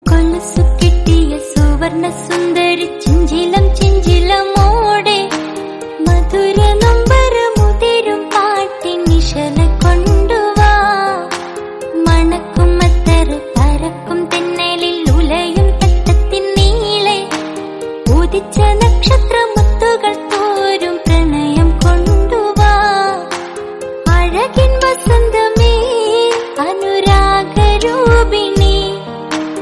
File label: Mappila pattukal Malayalam song